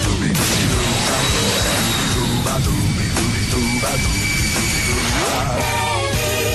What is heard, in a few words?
theme; perry het vogelbekdier